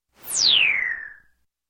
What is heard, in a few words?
Space Space Slide, Electronic; Sound Ideas 2000 Series